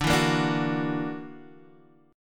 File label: Gb Augmented 7th D